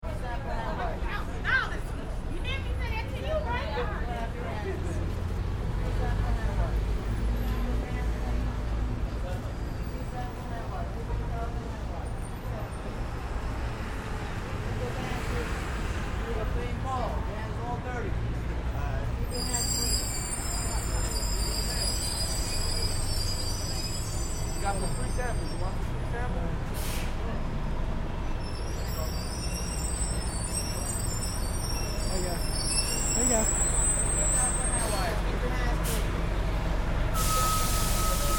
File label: City Street People And Traffic, Near Grand Central, NYC.; Crowd walla/chatter, breaks squeal, bus pulls up